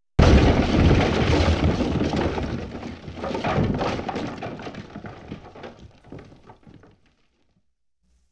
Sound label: Explosion, 5.1 Surround; Sony Pictures Sound Effects Series